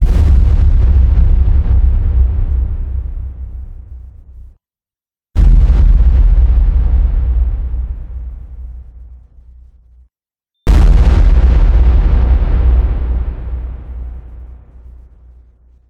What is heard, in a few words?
Bomb Explosion Variation